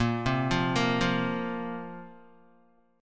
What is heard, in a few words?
A sharp Suspended 2nd down Guitar; arpeggiated; A#sus2; JGuitar